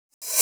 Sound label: Reverse Hat, hard techno; hard techno vol1